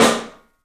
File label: PIC SNR L, PICOLO ROOM, 3D Drums; 3D Sound